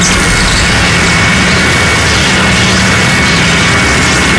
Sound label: Big Drill Shoot crit, weapons; tf2classic